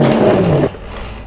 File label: crane extend stop; Half Life 2; vehicles